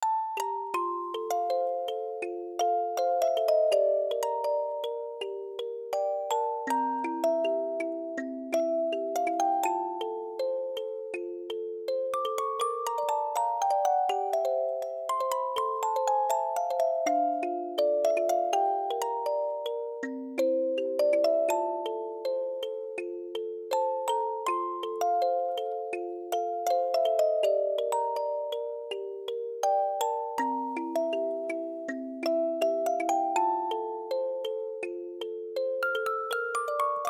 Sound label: Music Box